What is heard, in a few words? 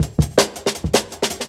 Jungle Breaks; Original Jungle Breaks